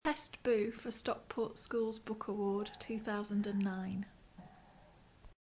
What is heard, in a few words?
boo